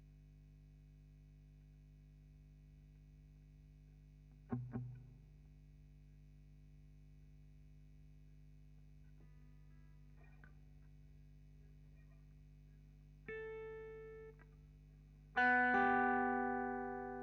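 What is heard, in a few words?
GTR Royer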